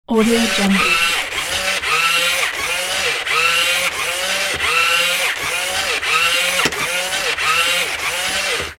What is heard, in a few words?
toy robot movement; sound-ideas